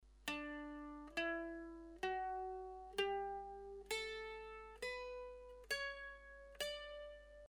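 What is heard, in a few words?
D scale whistle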